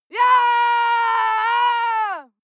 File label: female lunatic scream attack long; menschen; schreie